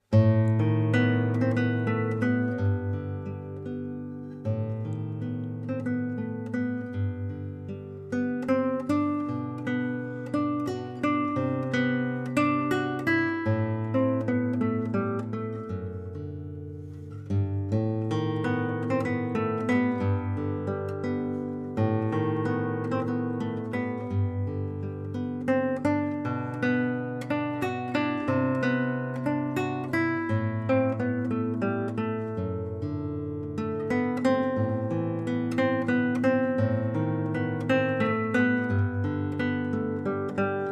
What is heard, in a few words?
classical guitar